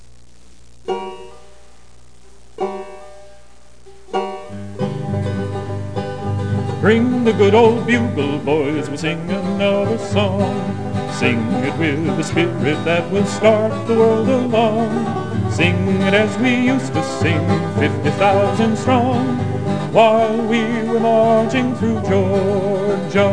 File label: marching; American Civil War; Interactive Magic 1997